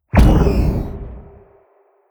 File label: station explosion energy; weapons; Stellaris